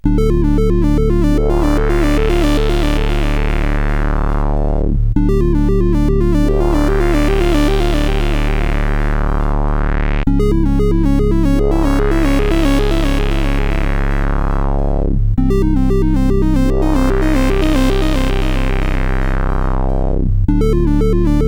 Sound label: Intro sid CSG8580R5